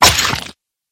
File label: hit axe